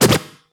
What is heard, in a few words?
Yamaha EX-5